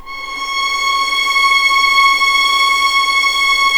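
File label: C#6 legato mf violins, left; East West; The Ultimate String Collection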